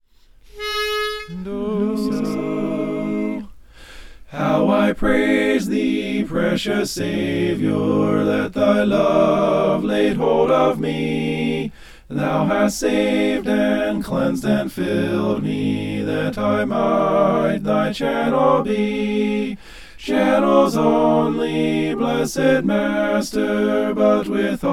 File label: mixed